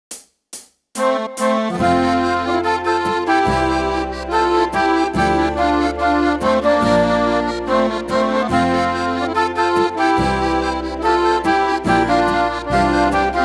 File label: Folk